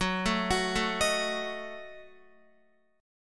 Gb Minor 6th down Guitar; arpeggiated; JGuitar

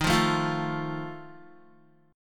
D sharp Suspended 2nd Flat 5th, Guitar; strummed; JGuitar

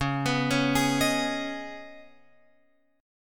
Db 7th Suspended 2nd, Guitar, Open F; x,8,11,8,11,10; Db7sus2 arpeggiated

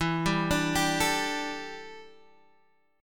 G Suspended 2nd E, Guitar; Gsus2/E arpeggiated; JGuitar

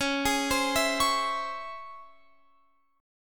C sharp Minor Major 7th C sharp Guitar Open F; C#mM7; arpeggiated; x,20,20,19,19,20

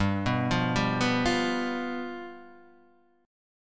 F Suspended 2nd Flat 5th G

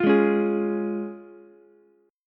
Db Major, Ukulele; strummed; JGuitar

